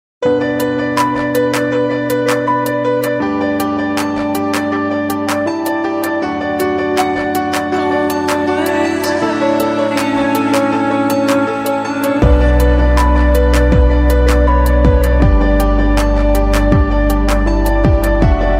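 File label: Piano Techno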